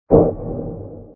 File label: Underwater Explosion; Sfx - Underwater Explosion 2